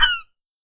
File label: seagull Whistle PEW